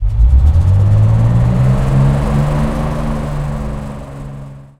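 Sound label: valentine engine interior load; cannons; War Thunder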